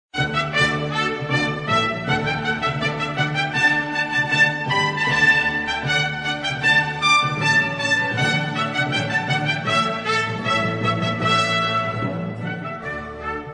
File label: Rondeau (Recessional; Mouret